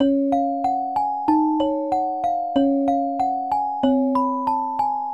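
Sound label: bgm music box; ゆめ2っき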